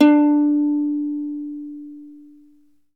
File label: uke D3